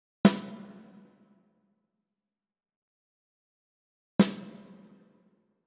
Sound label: Sn hall